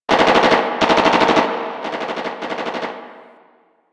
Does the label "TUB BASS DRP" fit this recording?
No